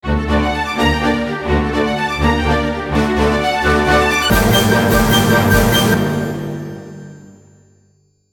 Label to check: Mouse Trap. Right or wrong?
Wrong